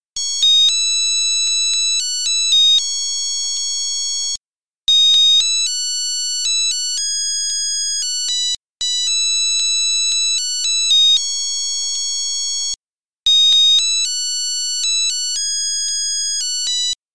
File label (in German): Eieruhr zum Mitkochen; space piepei